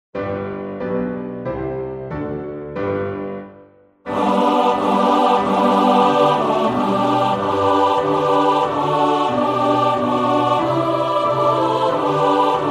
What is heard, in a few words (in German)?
Chor; Mendelssohn